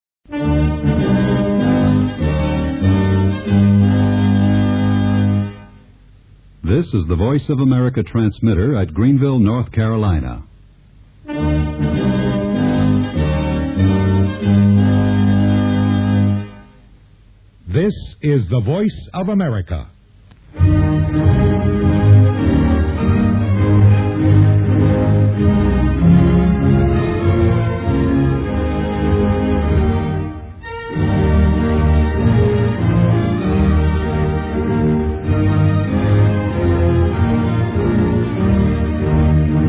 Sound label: interval signal; voa greenville; shortwave; usa; c1965; RIP VOA